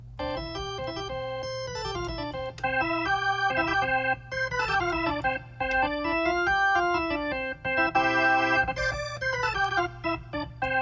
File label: Rotary Mod organ bypass